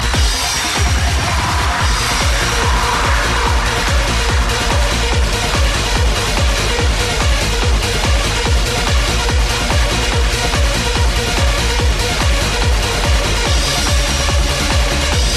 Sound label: Trance